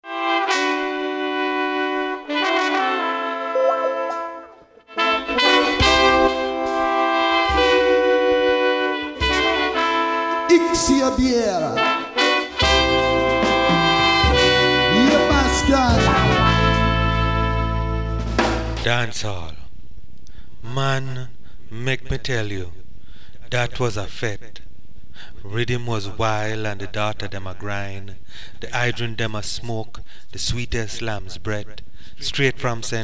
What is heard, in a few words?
dancehall